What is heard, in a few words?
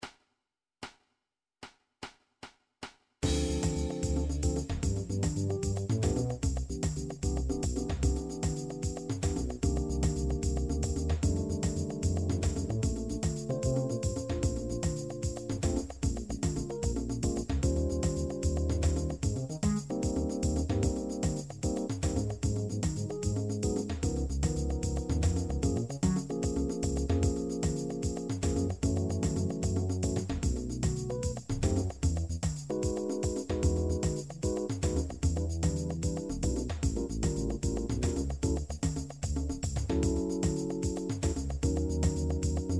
african, midi; song book